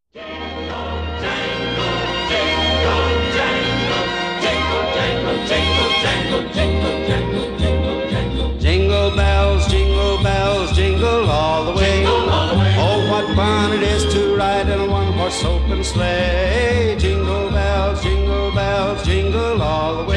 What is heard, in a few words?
Jingle Bells